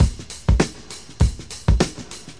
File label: mellow break, drum loops and breaks, 100 bpm; 091 to 100 bpm